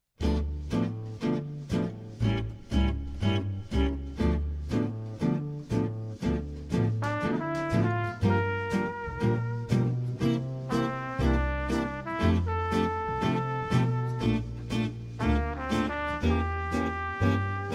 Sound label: Trad Jazz